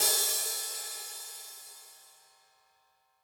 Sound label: Acousti Hat Hit, hihat; Ultimate hihat samples